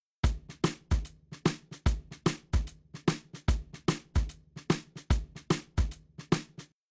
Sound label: Drums, shuffle drums; WDR2